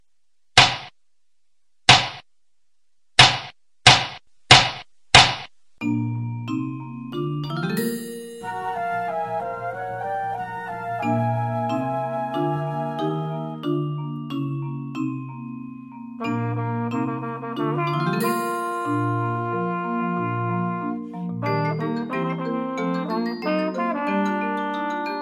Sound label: w Clicks